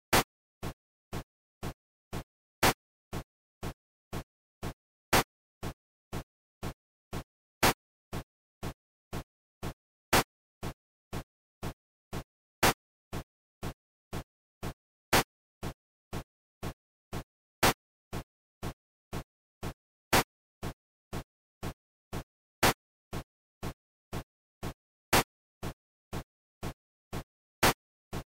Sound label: Five-beat; music; rhythm